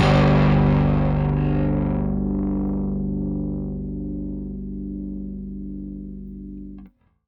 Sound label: Rickenbacker Bass; Airwindows; Rick-Xtras